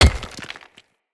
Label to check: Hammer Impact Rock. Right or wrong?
Right